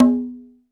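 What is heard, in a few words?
HR16 conga low; Crypton - DrumBase5000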